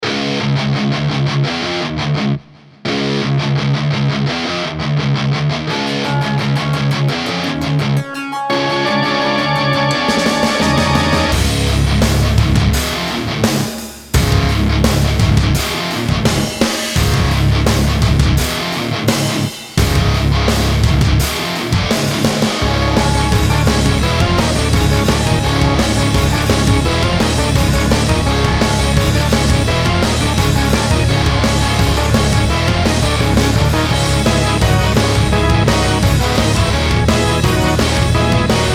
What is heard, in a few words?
sport rock trailer